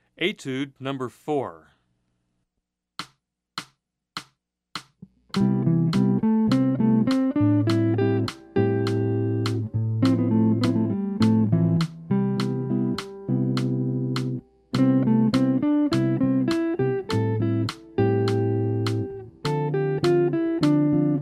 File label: Guitar Track Beginning Jazz Guitar; etude 04